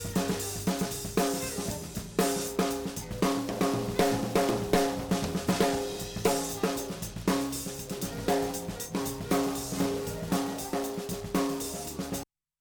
Roland SC Snare Ugly Limiter

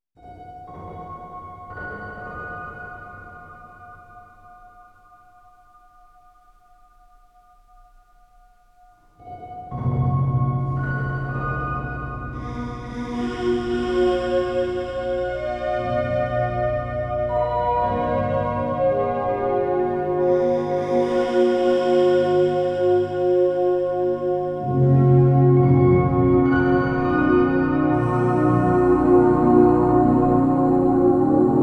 Ambient 2404 wet

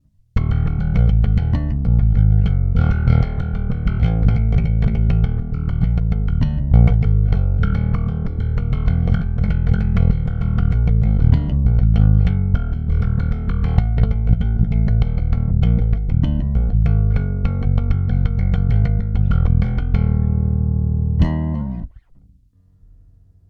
Both metal finger

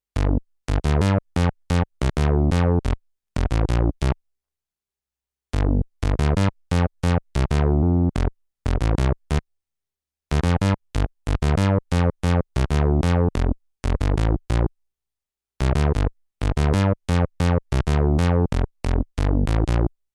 Bassline SE80

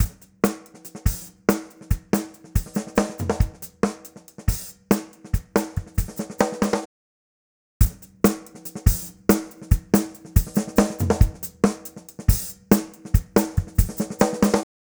Drumbus Hihat bleed Hammer

